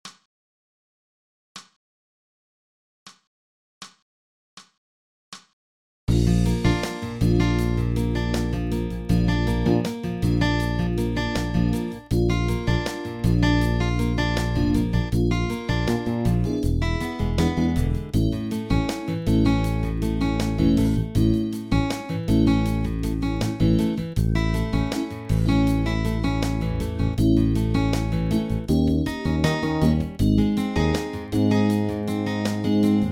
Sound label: Additive Slurs